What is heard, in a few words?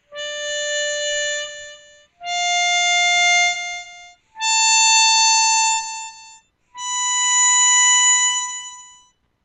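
Draw notes, harmonica; Breathing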